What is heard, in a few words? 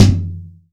high floor tom drum f sharp key; tom drum samples; one shots